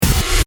Hit Stab Synth, Production, Element, Hit, Stab, Synth; BlastWave